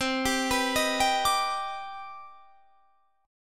C Minor Major 9th, chord; arpeggiated

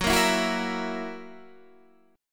Gb 7th Gb down Guitar Standard, chord; strummed; JGuitar

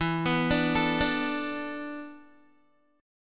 E 7th Suspended 4th, Guitar; E7sus4; arpeggiated

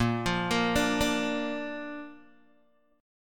Bb Major Bb down Guitar Open G

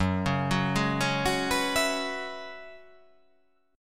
F sharp 9th Suspended 4th F sharp, chord

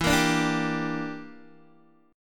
E Minor 7th Flat 5th, Guitar, DADGAD

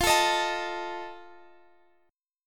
Eb Suspended 2nd Flat 5th F

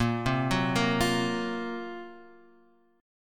Gb Major 7th Flat 5th

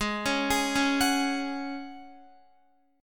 G sharp 7th Suspended 4th G sharp, Guitar; G#7sus4; x,11,13,13,13,14; arpeggiated

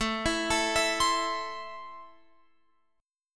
A Minor, Guitar, Open F; arpeggiated

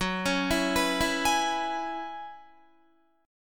F sharp 7th Suspended 2nd Suspended 4th, Guitar; F#7sus2sus4 arpeggiated

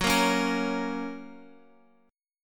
Gb Major Gb Guitar Open F; strummed

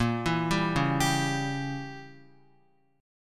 Bb Diminished 7th Bb, Guitar; arpeggiated; JGuitar

